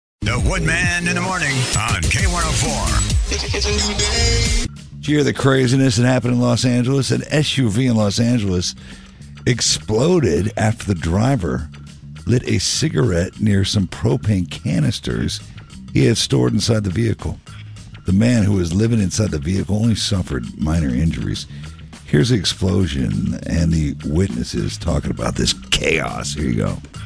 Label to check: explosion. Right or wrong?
Right